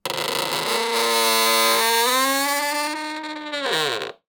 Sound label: metal door squeak creak; sonniss gdc